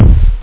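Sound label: bass drum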